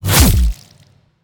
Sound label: Spikes Damage; helltaker